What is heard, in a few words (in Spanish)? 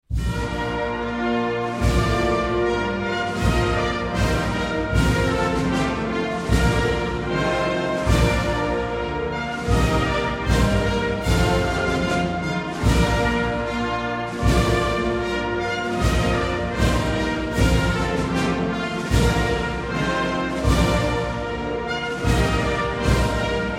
Himno Nacional de Espana